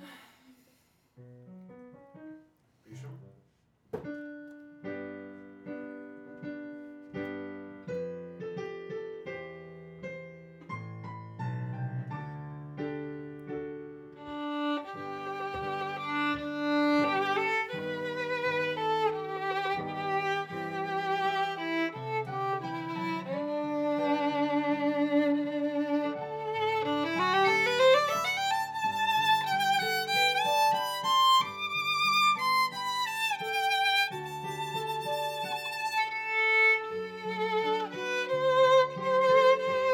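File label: Viola C minor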